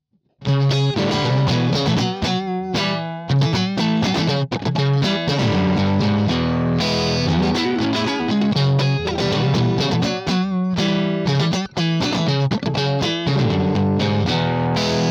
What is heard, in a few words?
Colonial Strat